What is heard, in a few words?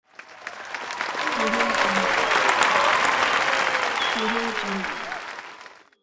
applause crowd